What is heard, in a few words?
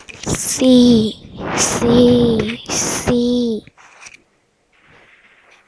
sea